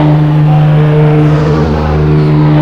EX ON IDLE, F1; AOS F1 2022; FSR2018

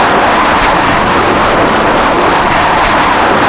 road skid ext wet, Kart Tires; AOS RF1 Kart Demo

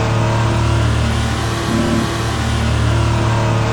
IN OFF VERY LOW, F1; AOS F1 2022; FSR2018

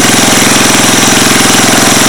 mower off low in

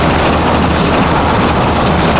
grass skid; AOS RF1 Kart Demo

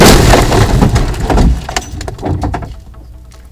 impact car heavy; Kart

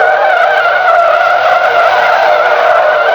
skid dry in, Tires; Kart; AOS RF1 Kart Demo

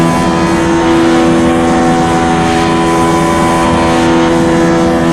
IN OFFHIGH; AOS F1 2022

